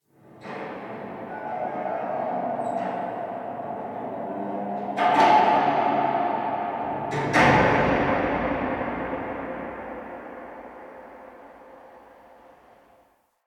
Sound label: Parking Lot Distant Metal Bang; Warframe; Ambience; Nineteen Ninety Nine